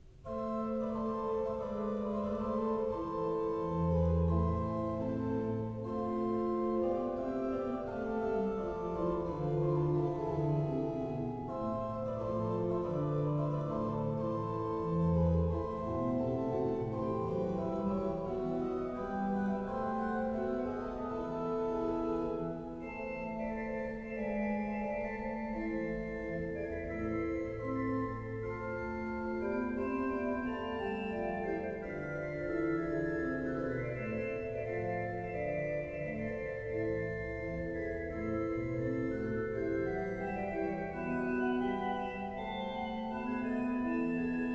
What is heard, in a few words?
Song Tune, Trumpet and Organ; Alison Balsom - Music for Trumpet and Organ; Warner, 2002; Musike from King Arthur, III.; 'Round Thy Shores'